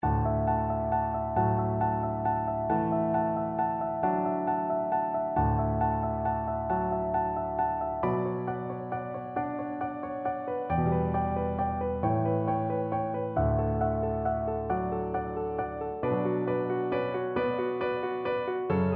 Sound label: lehmann evensong viola